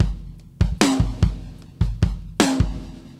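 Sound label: 75 bpm no hat, beat loops; Zero-G; Monster Beats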